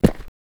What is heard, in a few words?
Short foley